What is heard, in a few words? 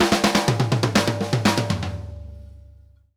ALL AROUND, SP FILLS 120, Drumworks; Sampleheads - New York City Drumworks VOL-1